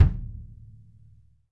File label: Bass Drum p, Drum Machines